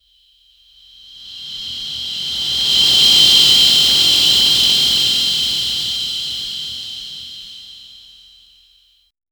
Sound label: form change transformatio, futuristic